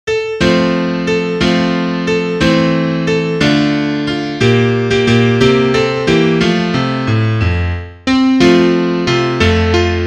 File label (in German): Klavier; Liederbuch; ich geh mit meiner laterne